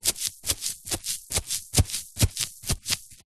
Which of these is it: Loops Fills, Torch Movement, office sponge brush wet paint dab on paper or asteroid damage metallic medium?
office sponge brush wet paint dab on paper